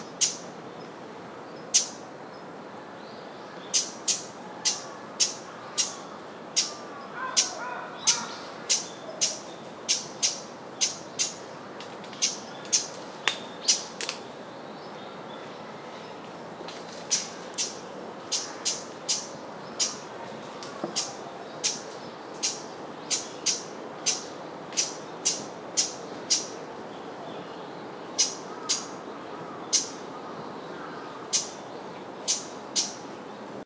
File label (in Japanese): uguisu